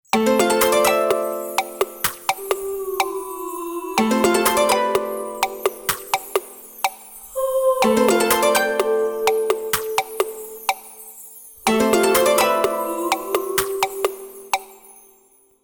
Harptivox, ringtones; Nokia

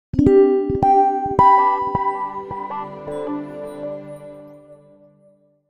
Calendar, Nokia Tones; Windows Phone apps

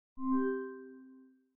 Charging Started

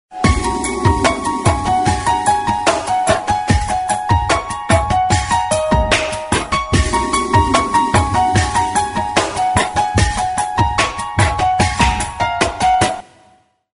ringtones; HTC Magic; Liquify

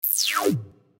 Electricity close; Nokia; audio themes

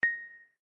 Voice Ready, ui; Samsung; Galaxy R Style SHV-E170s

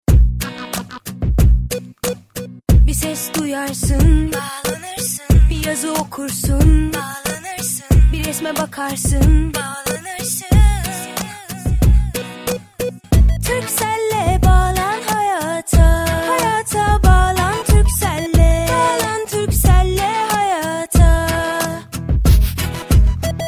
Melody; Turkcell

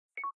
Video Record end

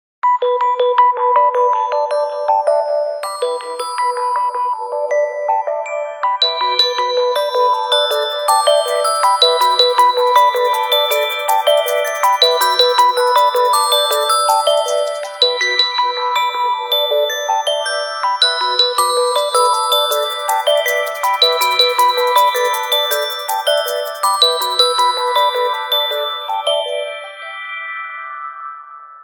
Nokia N79 tones, digital

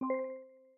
Charging Started Quick; Lenovo; ZUI 13

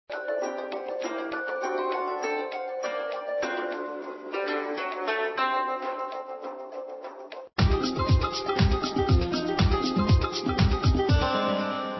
MIDI; SkyBee

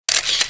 Shutter; L852i Prada; LG

